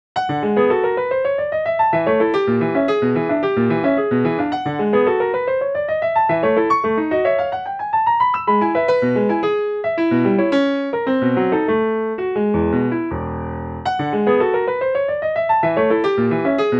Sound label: piano